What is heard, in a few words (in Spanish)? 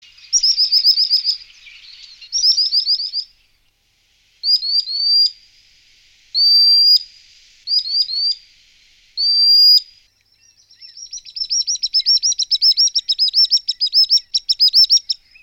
Andarrios chico, pajaros